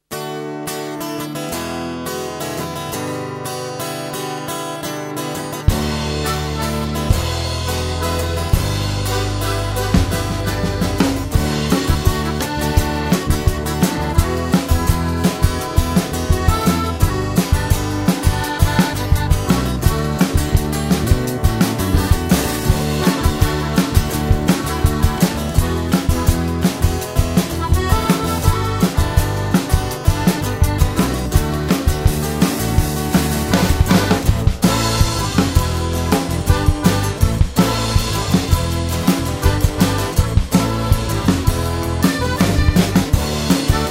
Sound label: instrumental